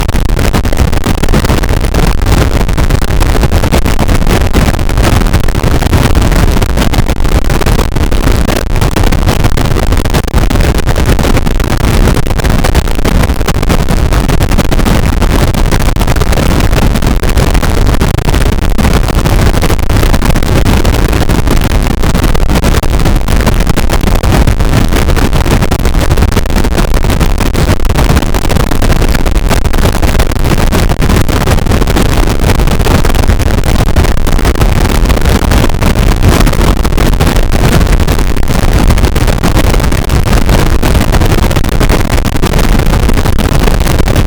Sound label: Harsh Noise Slowly Becoming White Noise; lolicore